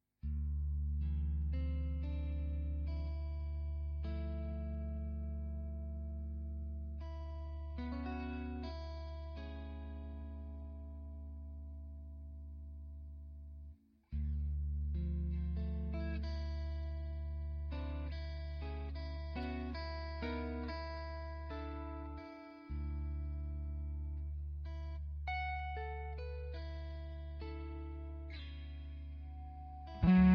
neck goldfoil impro; rautia

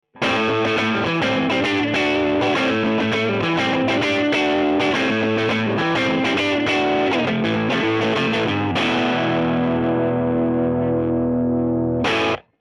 p90 chocolate bar middle

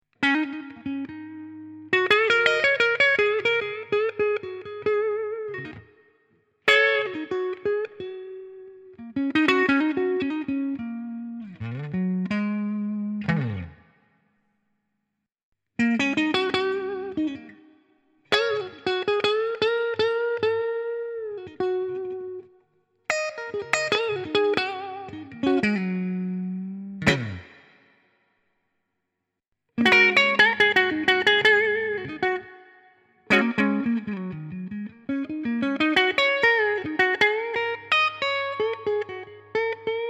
red clean

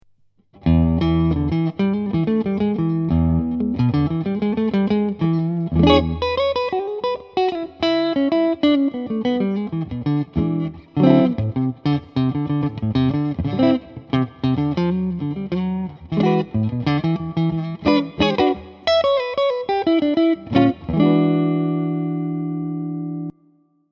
twang bar neck

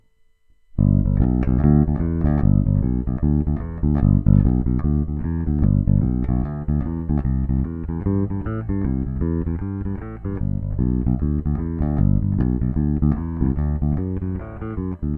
aria pro alnico series

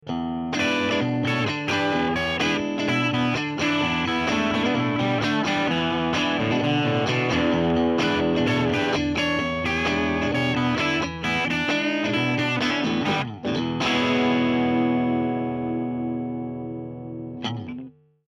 toast bar bridge middle

